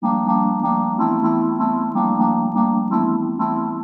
DEEPHOUSE ORGAN 125 A

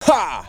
"HAH"; VOICES

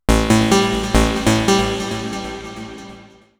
TECHNO EXTRA 140

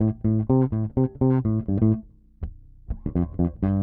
DEEPHOUSE BASS 125 A

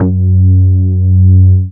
BASS MINIMOO

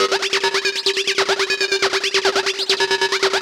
ELECTRO 140 A; ELECTRO EXTRA

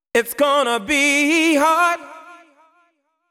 deep house male, 125, A, vocals

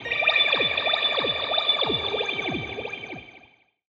G Synths, One Shots; Keys & Synths; Touch Loops; BlissedOutBeats

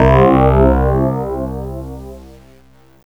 SOFT FM LIKE HIT; KillerTweaksII; DirectWave

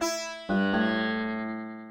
ethno, 125, A

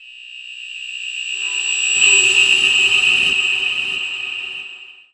TECHNO FX 140

